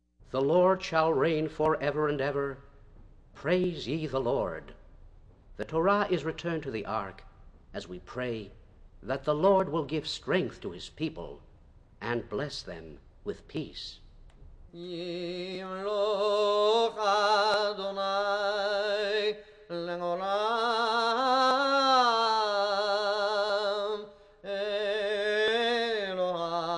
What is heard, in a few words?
Yimlokh Choir; Shabbat Morn